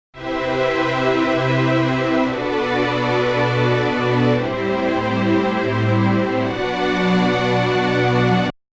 pad on

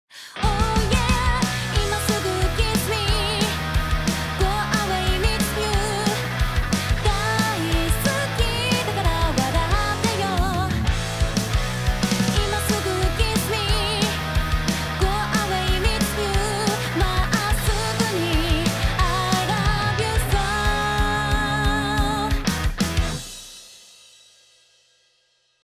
mix Centric Off

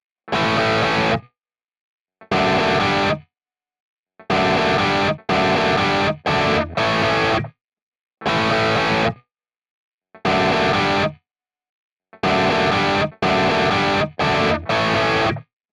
cab Off

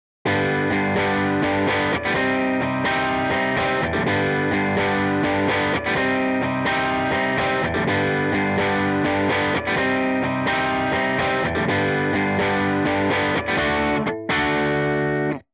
guitar

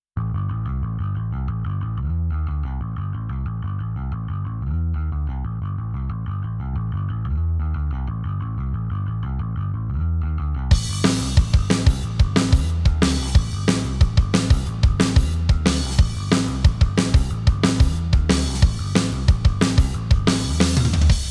bass

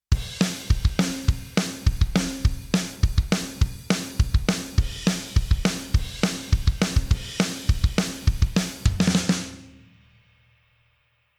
SSL Bus Comp On

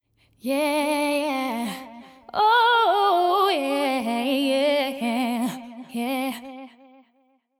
vintage on

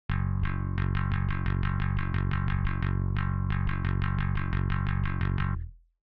bass off

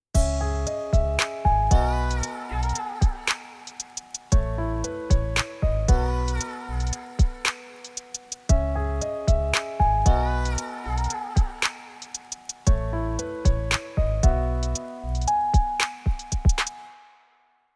mix Centric Off

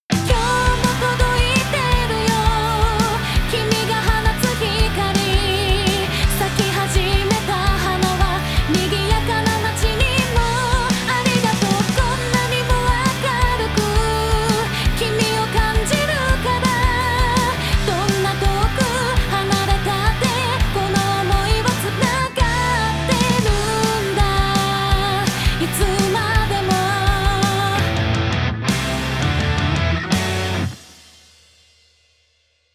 vocal on

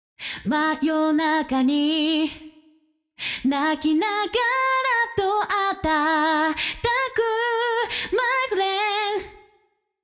Vocals

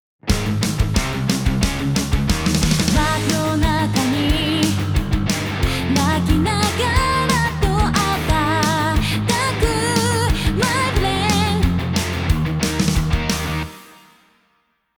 master Off

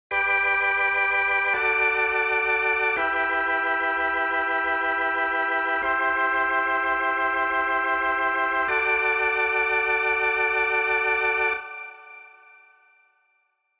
tape Delay On

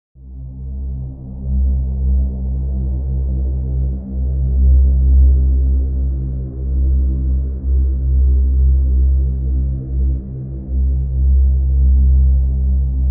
pad

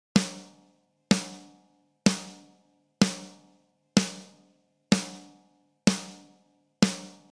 snare

